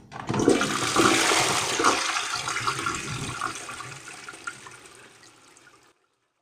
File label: toilet; digitales-heizhaus